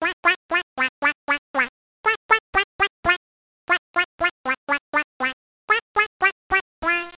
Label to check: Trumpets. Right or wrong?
Wrong